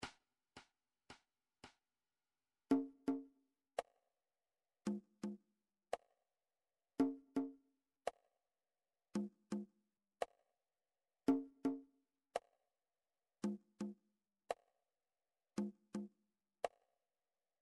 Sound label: drum trax per